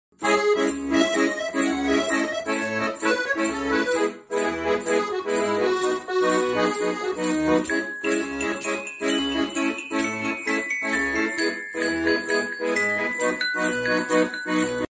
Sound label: Accordion; آکاردئون